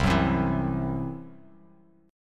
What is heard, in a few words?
C Major Flat 5th, Bass Standard; strummed